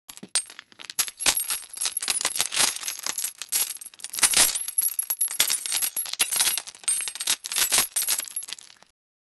breaking news sound effect

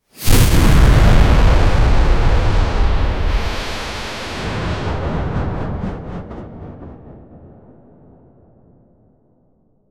wizard tower exploded, explosion

